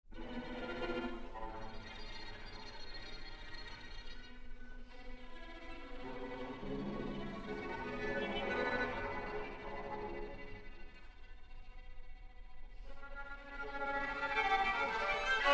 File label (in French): Trio A Cordes; stringTrio excerpts